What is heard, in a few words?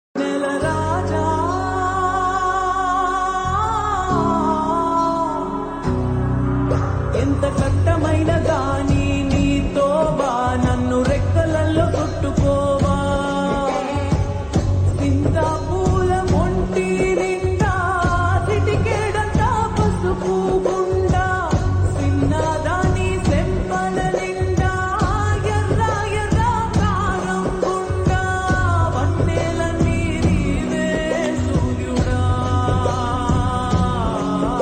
Ringtone Slowed Reverb, Telugu; game changer